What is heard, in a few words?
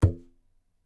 tabla; bahia; Dirt-Samples